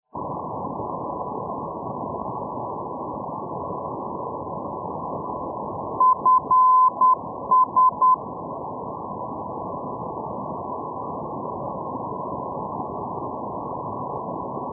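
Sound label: ndb, non-directional beacon; dresden-gompitz fs-374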